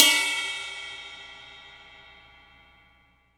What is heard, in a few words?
RIDE, Drum Dry; East Collexion; Drum 1 Dry